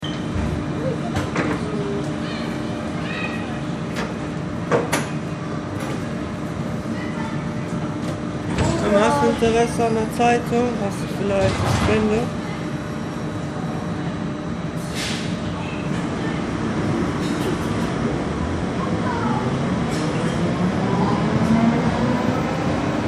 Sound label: humming and breathing trams at ebertplatz station; koeln